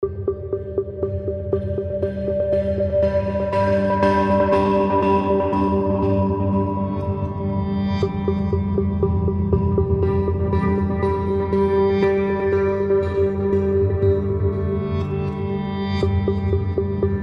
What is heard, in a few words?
Soft Pulse